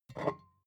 bottle vodka pick up slide, gastronomie

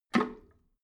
drain valve open regular, badezimmer

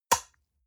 gel dispenser air suck in hard, badezimmer

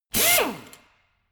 impactor medium, bohrer; maschinen

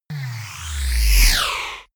power boosted fighting racer, raumschiff

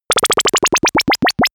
power drop, energie

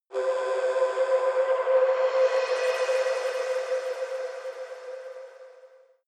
shot universal glance, sweeps und swells; comedy-cartoon; soundpool

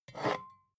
bottle vodka pick up slide hard, gastronomie

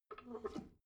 drain valve close soft, Badezimmer; haus garten

